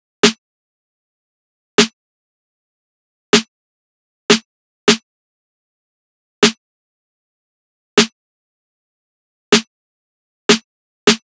Drum Loop 155 BPM Snare, Trap; Loop Stems; Cymatics; GHOST (Beta Pack); Ambush Drum Loop

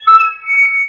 Megaphone Feedback; Home & Office

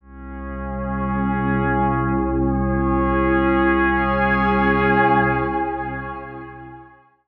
Pad; Dubstep

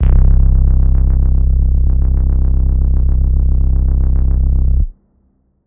Kit Eerie Synth Bass C, Trap; One Shot; Ghosthack; Eerie 150 A#m

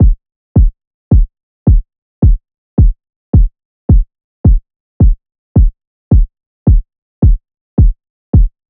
Drum Loop, 108 BPM Kick, Afrobeats; Cymatics; GHOST (Beta Pack); Banished

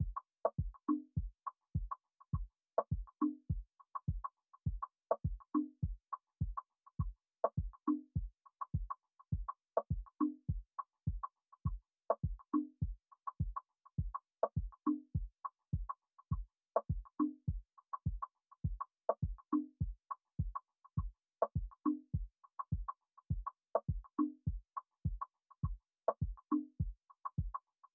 103 BPM F# Maj Percussion, Afrobeats; Melody; Loop Stems; Cymatics; Bahamas

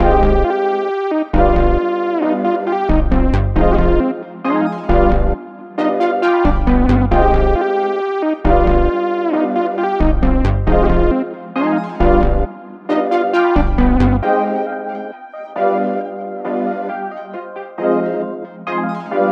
135 BPM C Min (JU60+OBX+REV2), synth loop; Tell Me; Cymatics - Fantasy Synth Sample Pack

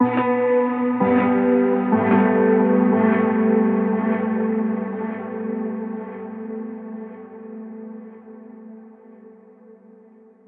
Guitar - Em 90BPM; STEMS; Ghosthack; SkyRealm; Mood Kits; Mood Kit 05 - Hands Of Time

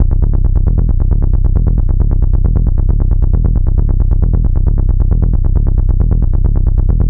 Bass Loop B 135, Techno; Ghosthack; AC2024 - Day 1 - Techno Sounds 2025

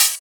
OH; Hats and Cymbals

